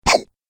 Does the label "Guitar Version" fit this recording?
No